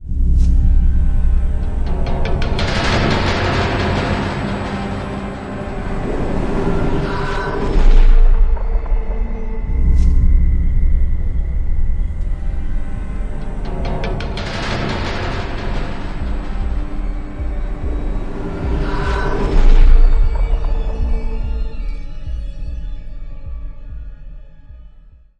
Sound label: cave